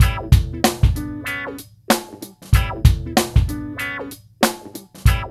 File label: LOOP